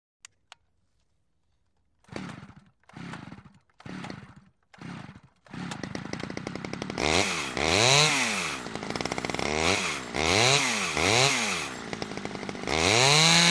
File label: Chainsaw Sequence, chain saws